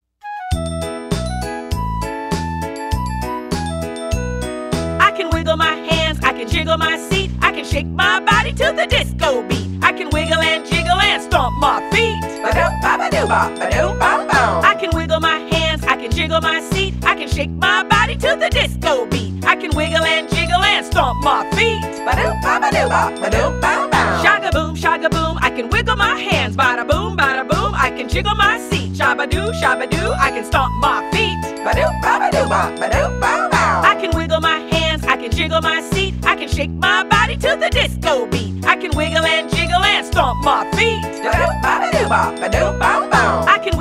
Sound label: wiggle and jiggle